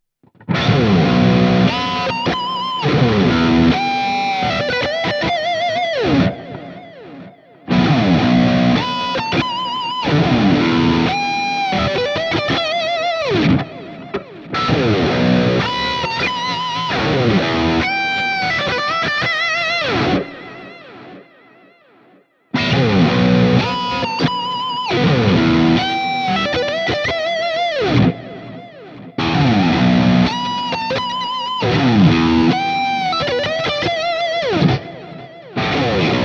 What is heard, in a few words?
Schecter BANSHEE MACH 7 Lead